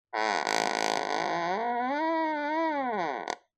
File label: household door creak slow; zapsplat